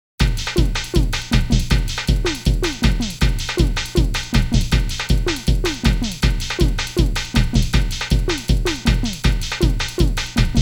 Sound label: Distortion Kick; dolucky's sandlot baseball